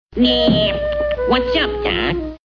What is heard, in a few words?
Bugs